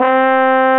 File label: wave 58; direct sound samples